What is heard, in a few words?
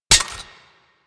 Revolver Rifle Mech; Warframe; Tenno